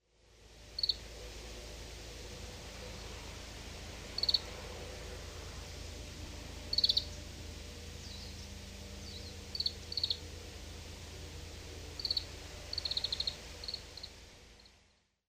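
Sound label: kawarahiwa, Oriental Greenfinch; ikeshima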